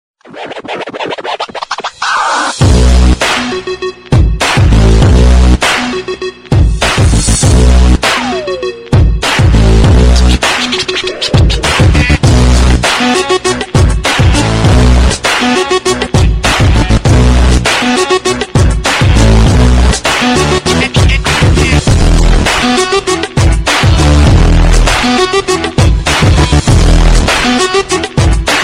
hard bass ringtone

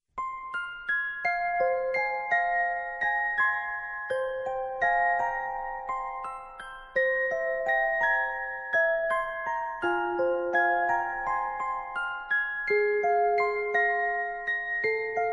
mysterious movie music tone ringtone; nokia ringtones

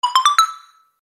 pops ringtone

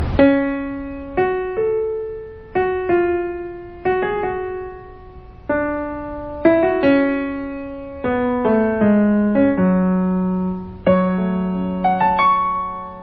piano slow ringtone

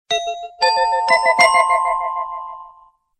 classical music text message tone

